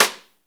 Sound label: SNR, Snare; Club-50 - Foundations Roland; Ext.Snare 1